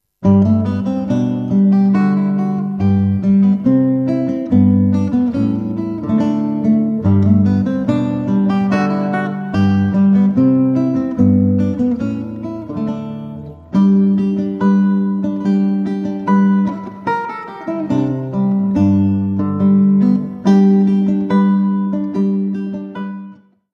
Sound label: Gavotte